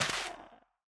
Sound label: break, soulsand; The Monster Plane